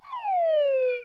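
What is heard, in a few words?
Birthday-Card Balloon-Rise Reverse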